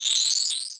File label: Science Fiction Sci-Fi Electronic Computer Readout